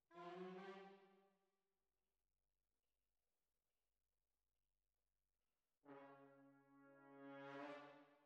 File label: Tuba, jazz for piano